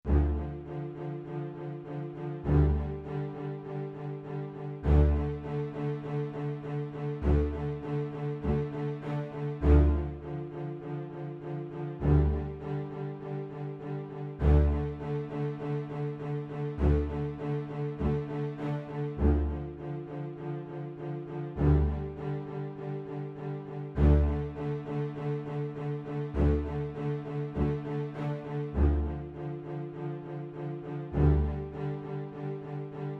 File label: Loop Menu Screen